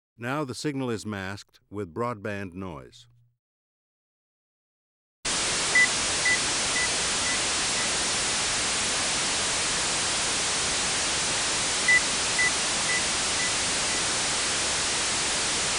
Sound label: Critical bands by masking